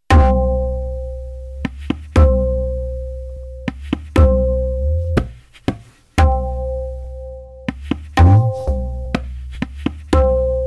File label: tintaal vilambit 30bpm